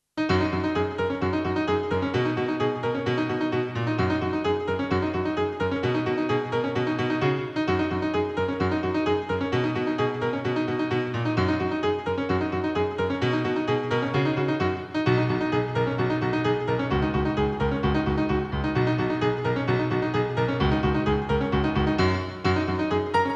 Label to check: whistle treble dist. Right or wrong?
Wrong